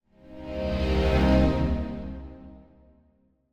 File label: Symphonic Hit Bass Strings Calls - Increasing Chord; Hollywood Edge; sound designer's toolkit v2